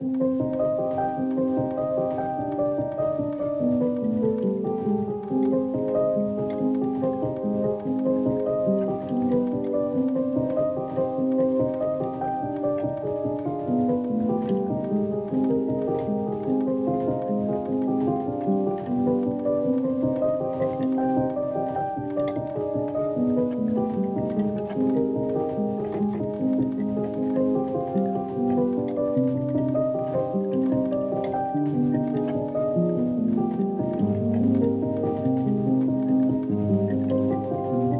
Piano Loop

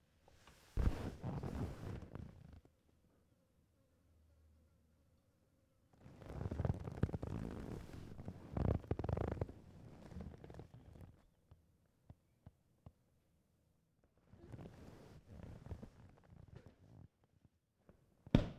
Leather Couch Sitting And Moving

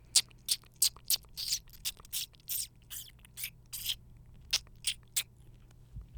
Thumb Sucking

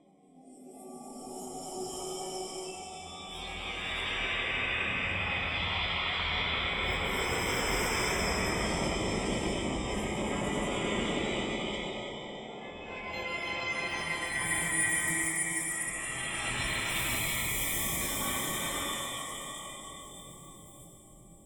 Mystery

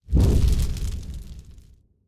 Fireball Pass By Crackle

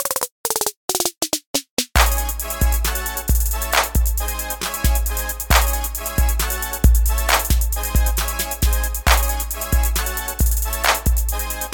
Rap Beat Loop 135 Bpm

